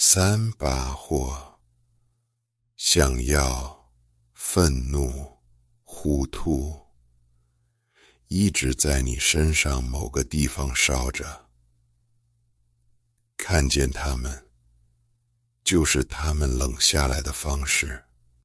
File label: drop, remix